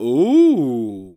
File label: Human Male Ooh Surprised Unexpected Ooh